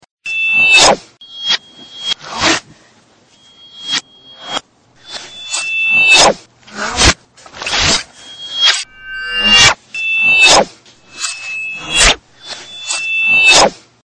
sword, reverse

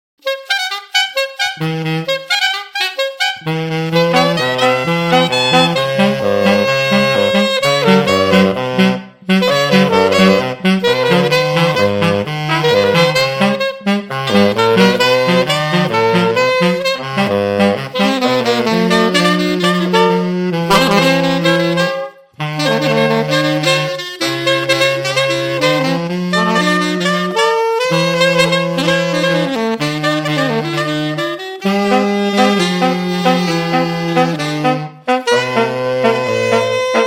sax

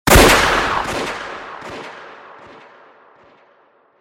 gun

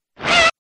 the sound lizalfos make; Ocarina of Time

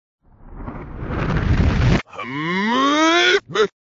kaboom, reverse

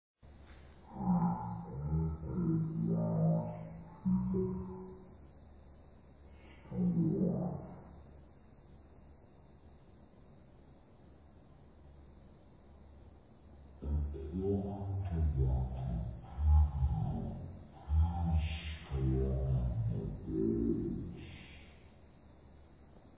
pee; vapesquared

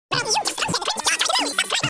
cringe

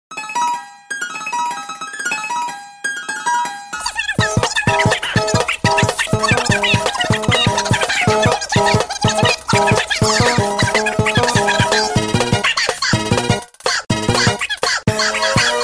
piano car

